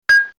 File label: north dink; Myst